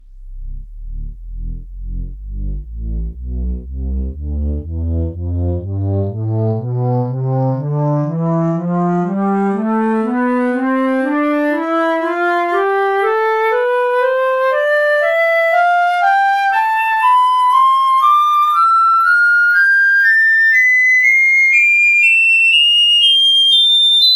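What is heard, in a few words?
flute scale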